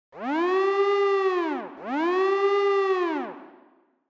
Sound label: Warning Siren, Fast Short